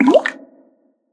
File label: bloop, fishing; Minetest; VoxeLibre